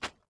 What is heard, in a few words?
fs dirt, footsteps